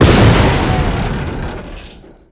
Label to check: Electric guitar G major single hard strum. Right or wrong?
Wrong